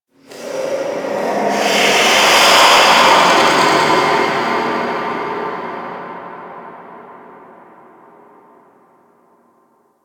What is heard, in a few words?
Ambience Hub Red Veil; Warframe; Tenno